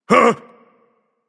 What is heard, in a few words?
nv fear, vox; smite; voicepack; vulcan